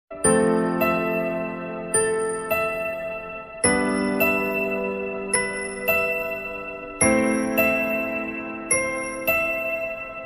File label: mobile ringtone